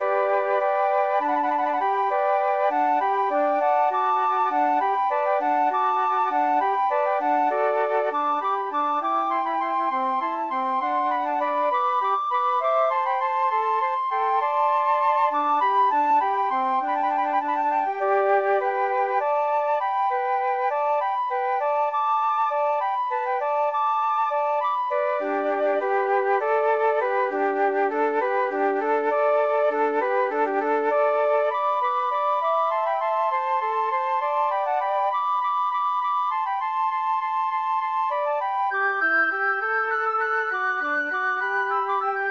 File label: alla hornpipe for flute trio; Water Music